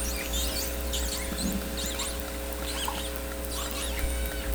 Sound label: call, Sea Sounds; S10